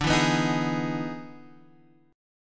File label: E Major 7th D, E/D Major 7th chord; EM7/D Chord {X 5 2 4 4 4} strummed